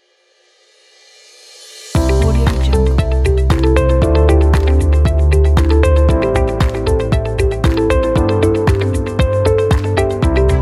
upbeat ident pack

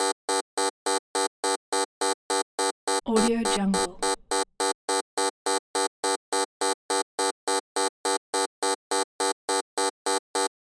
alarm buzzer

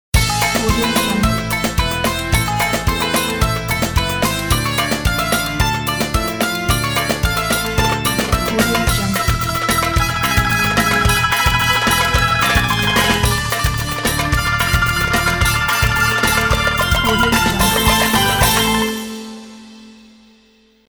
happy turkish ident

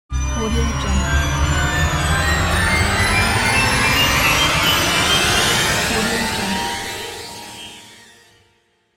horror rising stinger

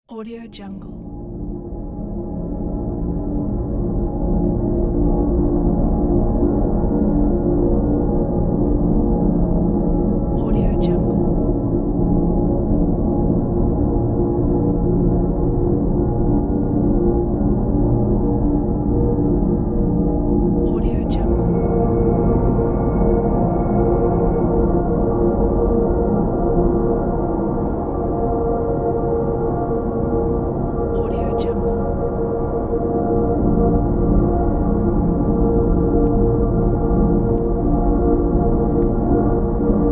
drone

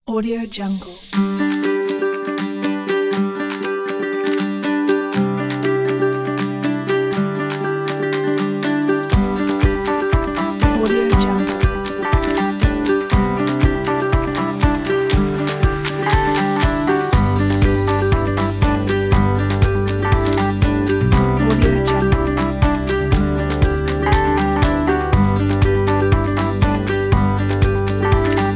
family acoustic

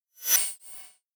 modern chirp in action

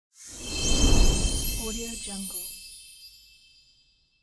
magic spell

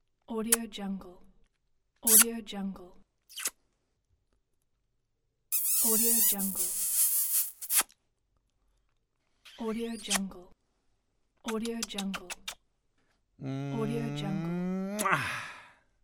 human kiss

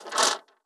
paper turn page

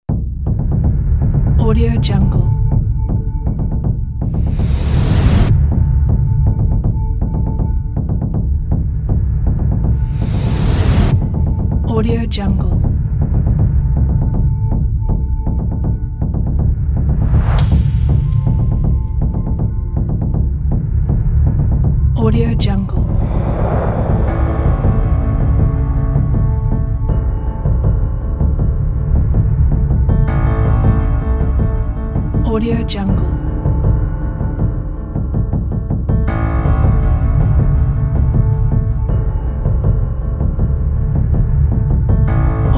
suspense trailer

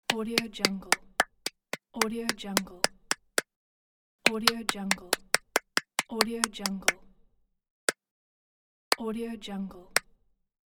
one person clapping simple claps set

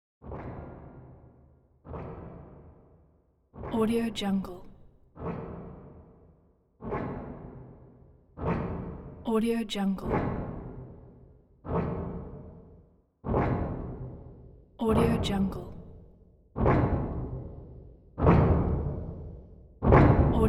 robot passing by

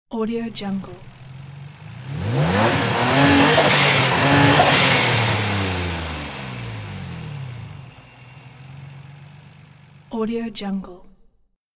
sports car engine

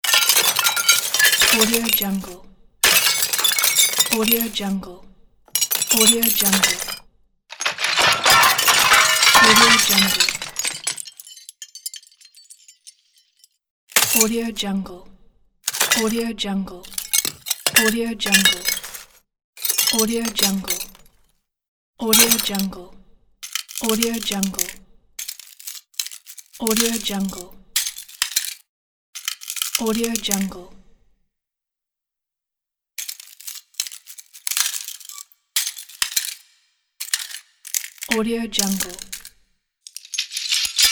breaking and cracking glass collection; matsteiner-fx

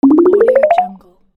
rise up

